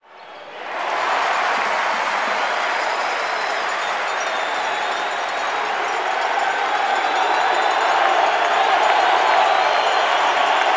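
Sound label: basketball crowd jeer; Crowds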